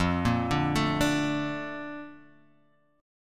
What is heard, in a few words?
A sharp Major 7th F